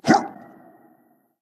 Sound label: hitreact human male small